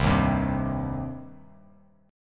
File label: C Minor C down Bass Standard; strummed; JGuitar